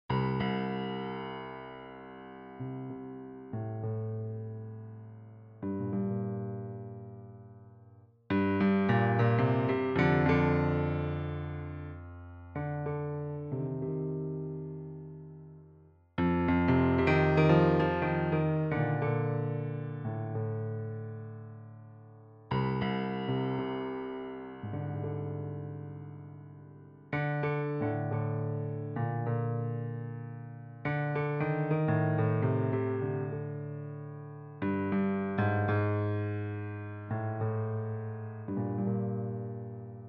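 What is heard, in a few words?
piano; Katarakt records; pia avril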